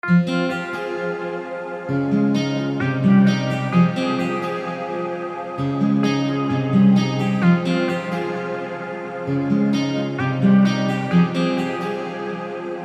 Ambience

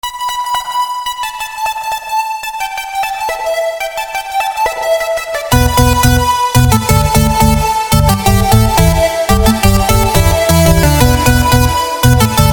Drum n bass

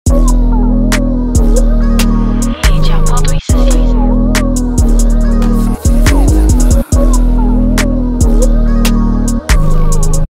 type beat; Redda x Lovemusic; prod.sladerr

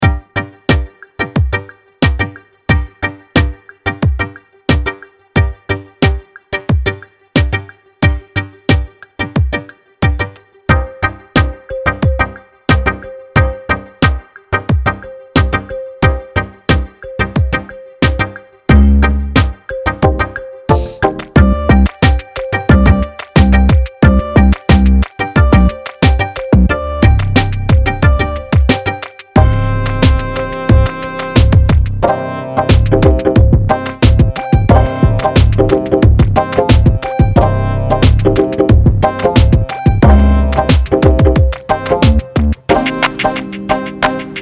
TECHNO TRAP 808

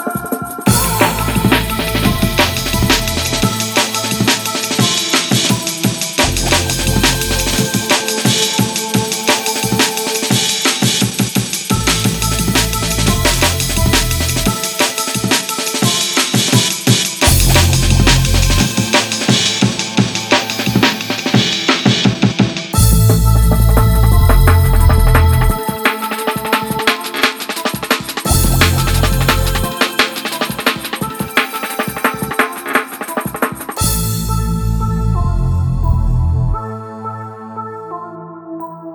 Drum Solo

